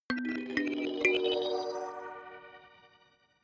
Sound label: notification kalimba magical triad up minor low